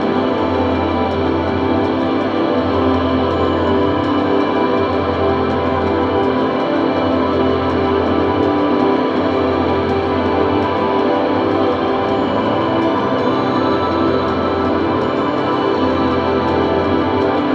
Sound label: full mix wet with airspace, hybrid reverb and delay; modeaudio